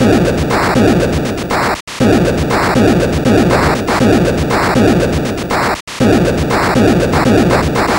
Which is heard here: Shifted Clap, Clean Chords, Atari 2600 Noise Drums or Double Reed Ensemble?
Atari 2600 Noise Drums